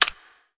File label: metal button